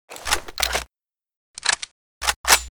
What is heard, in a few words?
g37 reload; STALKER